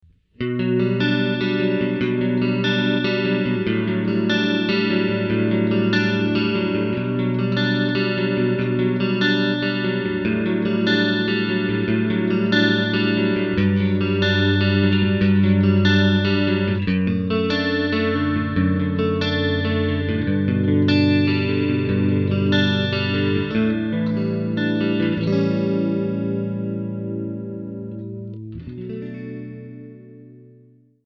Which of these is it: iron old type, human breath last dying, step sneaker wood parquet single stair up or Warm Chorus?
Warm Chorus